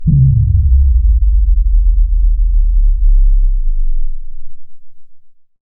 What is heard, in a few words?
sub thump; Best Service Alpha Dance vol.2; ZAPS+THUMPS